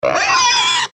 pig squeak

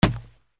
football throw

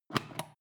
foot switch turn on off quick

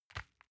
envelope grab soft

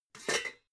pots clank soft

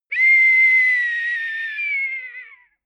whistle police faint

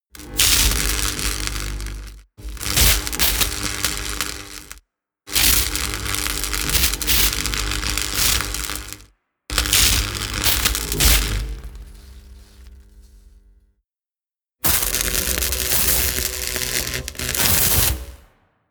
spark

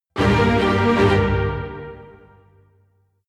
jingle orchestra fast

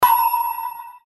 bell - high pitch

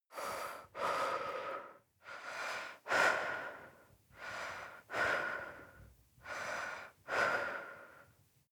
breath female normal sequence

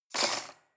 cutlery cake forks drawer place hard

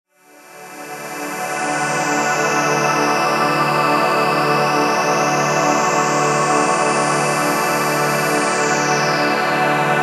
harmonic machines

